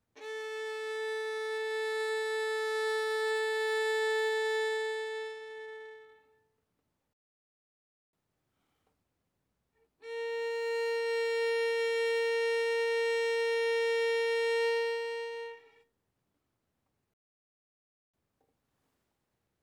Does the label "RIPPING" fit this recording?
No